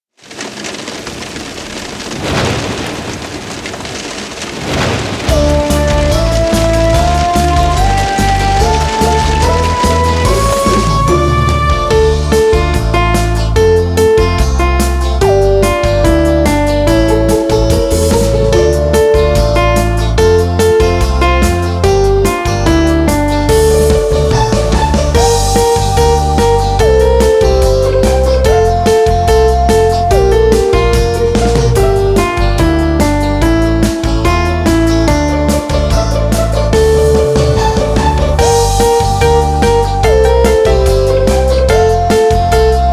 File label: karaoke